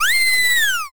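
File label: scream female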